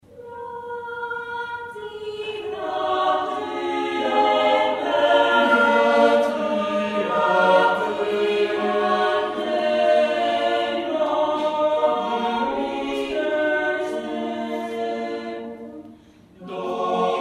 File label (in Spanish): coro camara